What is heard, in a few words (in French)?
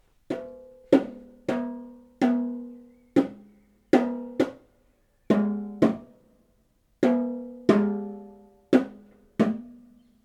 Bongos Synthetiques